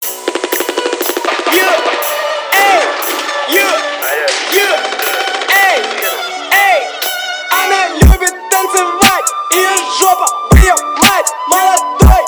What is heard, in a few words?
phonk